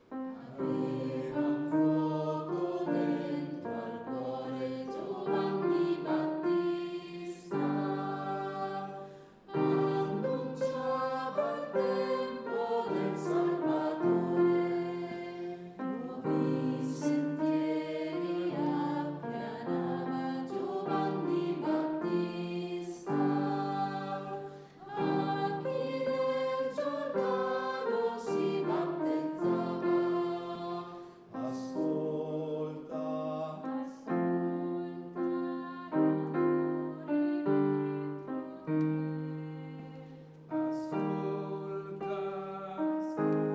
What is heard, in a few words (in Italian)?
Giovanni Battista